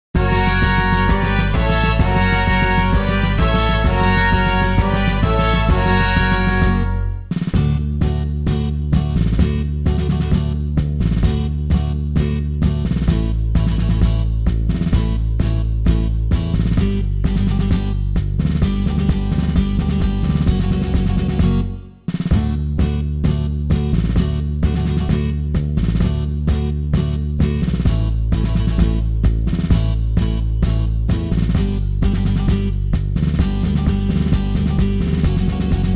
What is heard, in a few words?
GUITAR TANGO